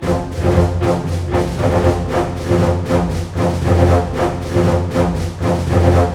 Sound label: 120 READY, Scoring Tools ORC Ready Fight; East West